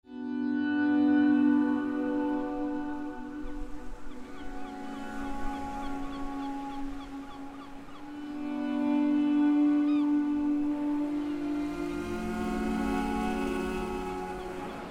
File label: autoharp; CINEMATIQUE